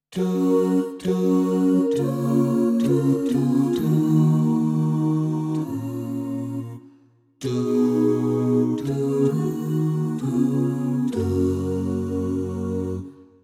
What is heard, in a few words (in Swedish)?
Bas